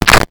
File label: Mute Synth Pzzip